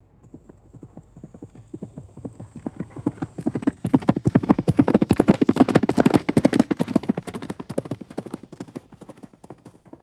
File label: horse galloping past on dirt; footsteps